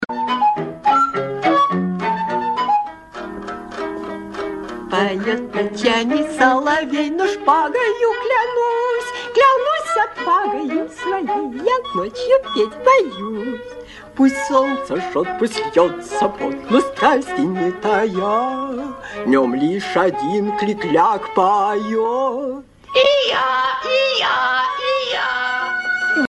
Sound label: klik